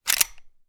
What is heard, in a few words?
gun model 1894; still north media